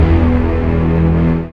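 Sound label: synth strings, Roland, dance synth; Sound & Vision - Gigapack I CD 2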